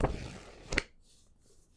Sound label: Riffle Pages, books, paper; Books, Paper, Writing